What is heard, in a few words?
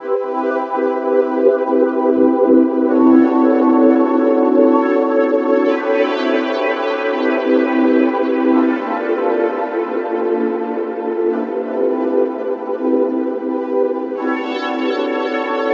Morning Time, Pads; KJ Sawka; Drum n Bass & Beyond